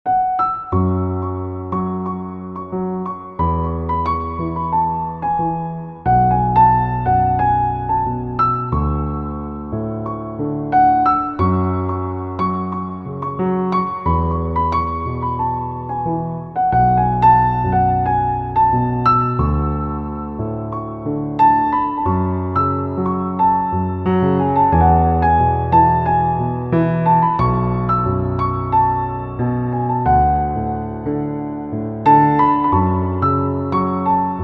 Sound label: Piano